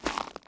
zombie footstep; DeadFright

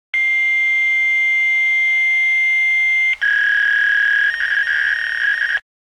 office fax machine; NCH Library

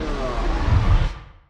Punch Left Speed Up; Pitch Black - A Detective's Demise

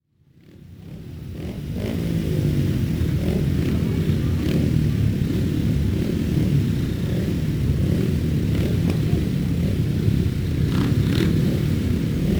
sport motocross; best service Blue Box; sports

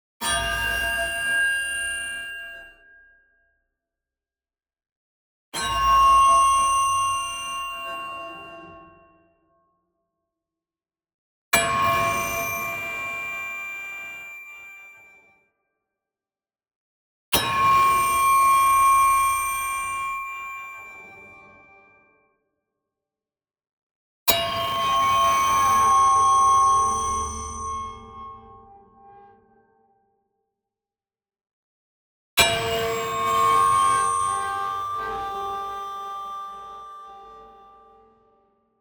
PING Feedback Siren; boom library; Neon

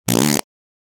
Fart Foley - Surprise - Medium Healthy Rip; Hollywood Edge; sound designer's toolkit v2

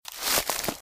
Footsteps - Bush walk - Right; Eden; RadwinIsland

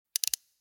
GUNS MENU OK; A Western Drama

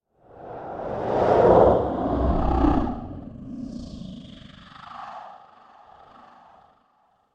Demon Growl Hover - Zombie Breath; Hollywood Edge; sound designer's toolkit v2

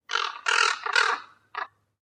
Raven; Inquisitor 3

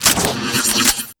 Gun Reload Scifi, Weapons Foley; SFX Kit

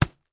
THUD Bright; mono; Universal Sound FX; THUDS & THUMPS

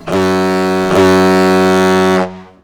horn; Apotheorasis

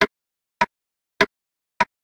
clock tick; DeadFright

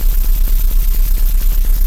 Scifi Hover Sentry Loop; SFX Kit; Sci-Fi Loops

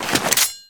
Gun Draw Select Weapon, Weapons Foley; SFX Kit

